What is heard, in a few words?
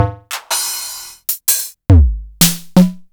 Korg Electribe ER-1 Kit